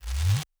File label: Laser Blaster Charge